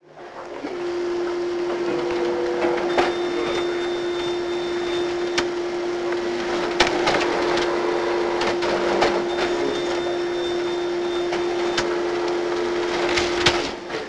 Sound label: Copier